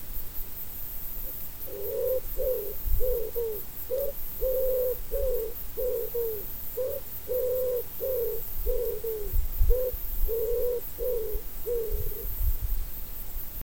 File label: verso canto colombaccio columba palumbus common wood pigeon; Verso tipico; ringeltaube; bioacustica